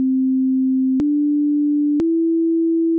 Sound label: c d e; Middle C